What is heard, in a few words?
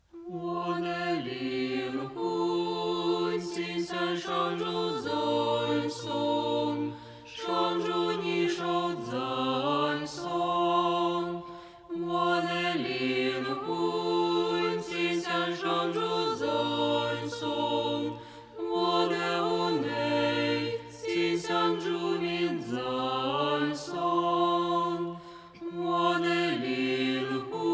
First antiphon